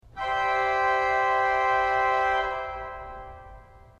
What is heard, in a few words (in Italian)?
mixtura C